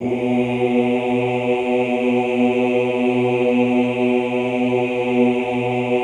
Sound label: OOH B1, Classical Choir; Best Service; Extended Classical Choir